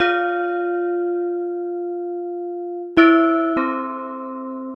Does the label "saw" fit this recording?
No